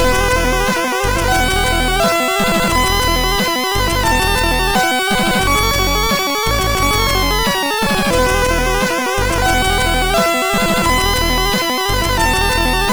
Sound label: Intro Dragon tune 3, MOS6581R2